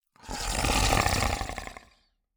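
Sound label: Zombie; Clam; Blind Survivor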